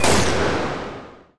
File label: pounce leash end; weapons; dota; slark